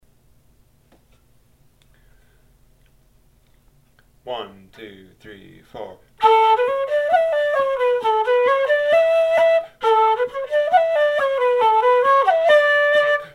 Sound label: Polka fast; Ina's Polka